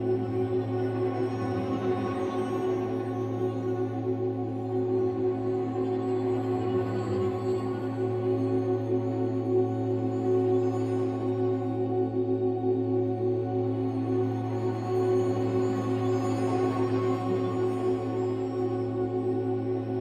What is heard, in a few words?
Tension; Stage 02 Predator Hole Tension 03